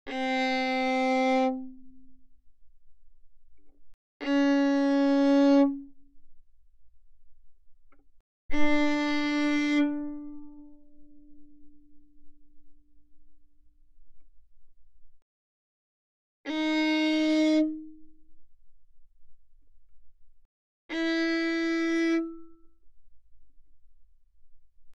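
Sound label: Violin arco fortissimo sul G C4 B4; Strings; violin2012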